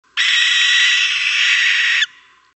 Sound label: Tyto alba; Richiamo; NOTTURNI (2006-2009)